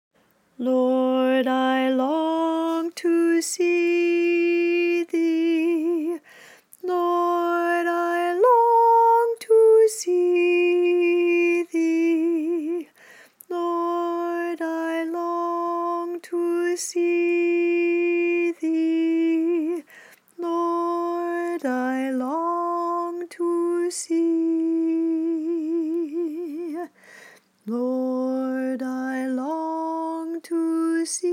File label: Lord I Long to See Thee alto